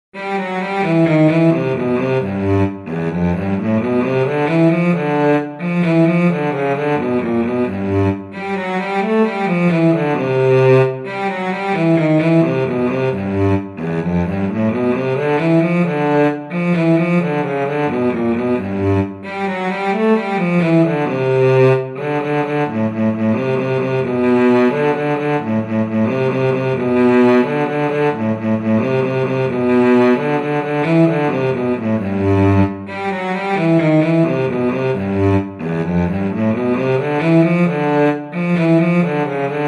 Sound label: cello; mexican hat dance